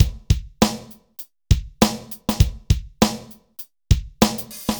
dry Loop, BPM 100

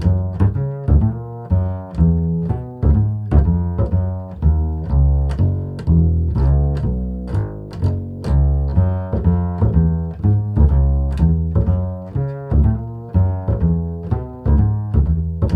KILL F#, Bass; Spectrasonics; Bass Legends

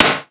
sawed off snare

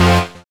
SYN, dance; Sound & Vision - Gigapack II

